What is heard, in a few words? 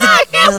VOX L; Big Beat Samples & More; AKAI; VOX FX1 KIT